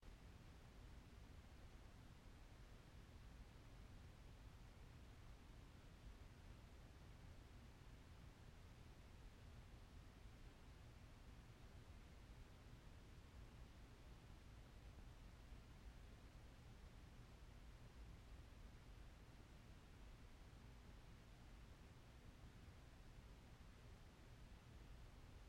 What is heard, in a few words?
Classroom Roomtone; blindside